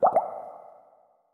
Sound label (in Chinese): 气泡柱上升环境声; ambient